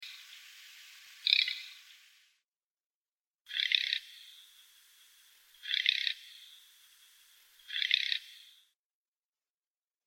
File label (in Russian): chirok treskunok golos